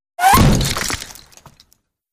Bullet Impacts Hitting Masonry With Whir In And Debris